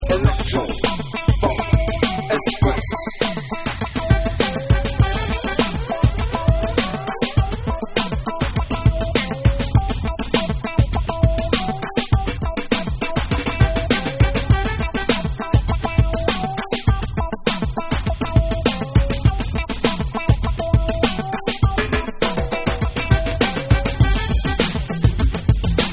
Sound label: ELECTRO FUNK